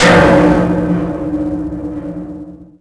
land tank turret, terrains; Tony Hawk's Project 8; XBOX

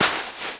Walk Dirt; Player; Footsteps

